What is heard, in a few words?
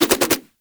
nunchuck spin whoosh; Warframe; Infested; weapons